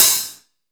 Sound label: MIX LHH, 14 INCH OLD HI HAT